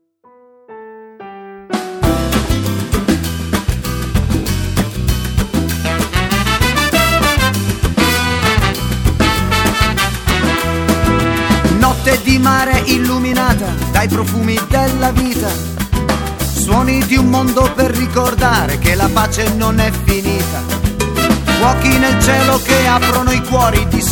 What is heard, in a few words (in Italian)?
notte di rosa cumbia